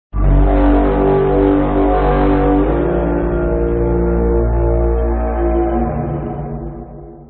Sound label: Gjallahorn; Vikings